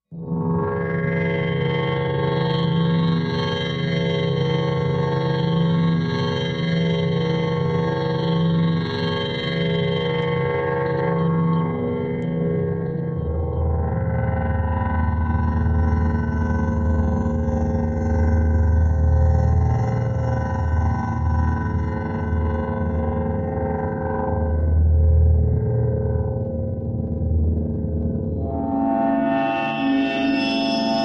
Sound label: Flaw Regulator Machin, metallic